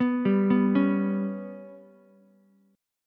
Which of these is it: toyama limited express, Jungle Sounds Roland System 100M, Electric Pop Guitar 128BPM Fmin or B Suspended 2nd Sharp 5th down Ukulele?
B Suspended 2nd Sharp 5th down Ukulele